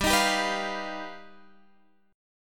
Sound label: G Augmented 7th, Guitar, Standard; strummed; capo 2; x,10,13,12,12,13